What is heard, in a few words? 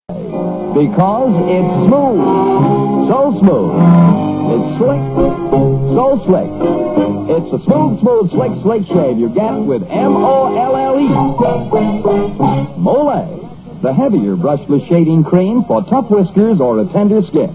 Jingle; Molle